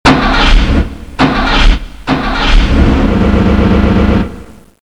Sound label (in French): Démarrage voiture